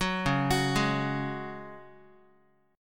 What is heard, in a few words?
C sharp Suspended 4th C sharp down Guitar; arpeggiated; JGuitar